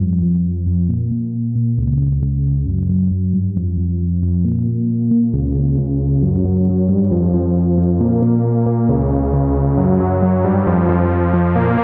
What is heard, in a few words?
NRG Sweep, Trance